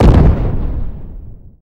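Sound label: Weapon anti-tank Gun - Medium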